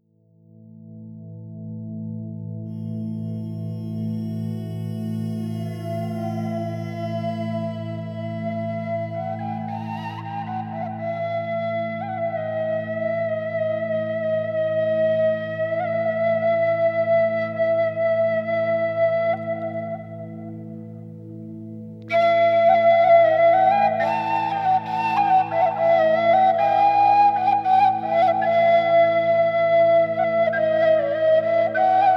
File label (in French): flute traditionnelle